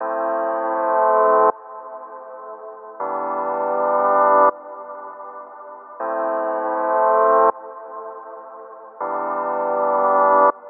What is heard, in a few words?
Reverse Keys Loop Am 80Bpm; Granular; Lo-Fi; Granular Musical Loops; Ghosthack Advent Calendar; Lo-Fi Kit